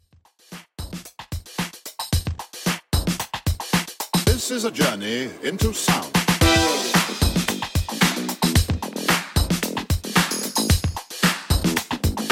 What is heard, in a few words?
80s Funk Redrum 112 Bpm